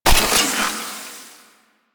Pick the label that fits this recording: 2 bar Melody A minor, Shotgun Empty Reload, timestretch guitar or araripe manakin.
Shotgun Empty Reload